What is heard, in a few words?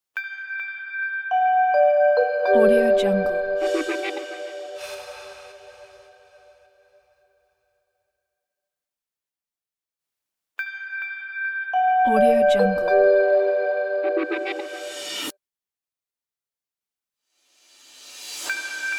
intro transition; teasound